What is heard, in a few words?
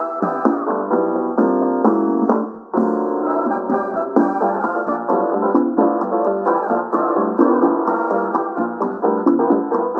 latin lo